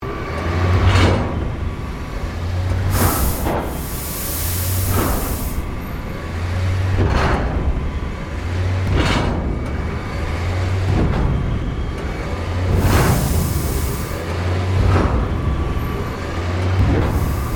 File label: MECHANICAL WALKER GIANT METAL STEAM SEQUENCE LOOP STEREO, Giant Robot footsteps; Robots (Sound Effects:Science Fiction